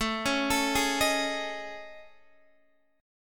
A Major Sharp 11th, Guitar, DADGAD

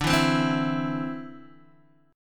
D 7th Suspended 2nd down Guitar DADGAD

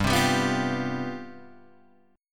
C Major 7th Suspended 4th, G, guitar chord

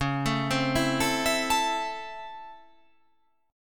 C sharp Minor Major 7th Sharp 5th C sharp, chord

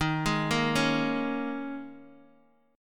Eb 7th Suspended 4th, Guitar, DADGAD

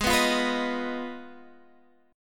G sharp Minor 6th G sharp down

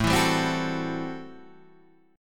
A Minor 13th A Guitar Half Step Down

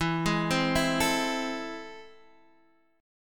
A Minor Major 7th, E

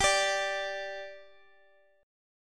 G 5th G Guitar Half Step Down, chord

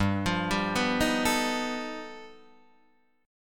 G Augmented 9th, Guitar, Open D

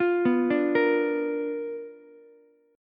B Minor Major 7th Flat 5th Ukulele; arpeggiated; JGuitar

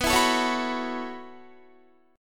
B 9th Suspended 4th B down Guitar; strummed

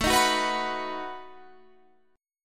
B-Major A

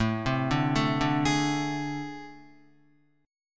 A Minor Major 7th Double Flat 5th, down, Guitar; arpeggiated; JGuitar

